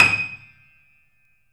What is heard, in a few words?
D#, 3D Pianos; E-MU Producer Series Vol. 5; 3-D Audio Collection; Boes Play Hard